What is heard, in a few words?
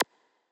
robot chirp